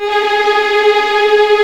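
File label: G#5 tremolo, String; East West; The Ultimate String Collection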